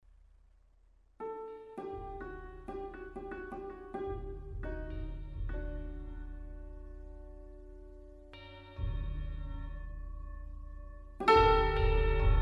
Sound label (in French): percu et cymbalon